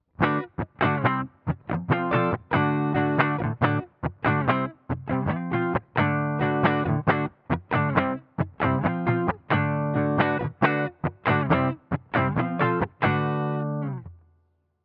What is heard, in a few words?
clean neck; custom77 cs4